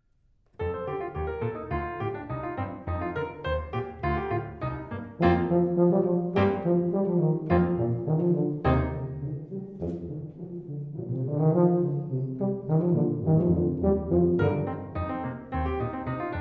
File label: tuba duos